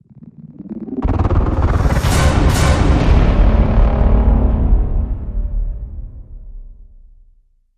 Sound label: Hit; Sttr Crisis Point